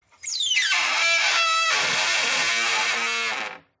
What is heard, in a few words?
Wood Stress Creak Squeaks; DPA4060; pmsfx